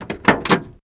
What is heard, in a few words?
Chevy Car Door Open